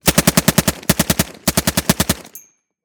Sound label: Submachine Gun - MP 40 - Burst; Fascinated Sound; The Gun Locker SFX Pack